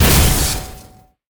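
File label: Mech Gorilla explosion; monster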